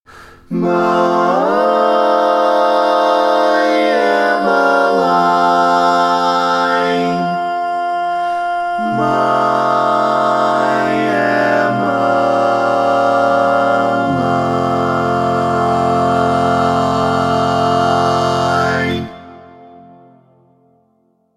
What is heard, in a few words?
All Parts, barbershop